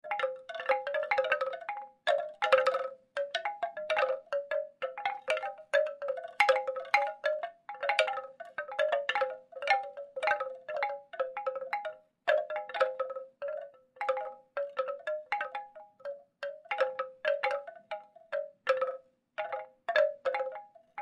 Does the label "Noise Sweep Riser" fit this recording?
No